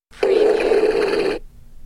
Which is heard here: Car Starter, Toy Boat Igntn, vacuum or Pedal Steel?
Toy Boat Igntn